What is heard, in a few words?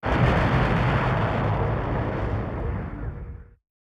Ship Explode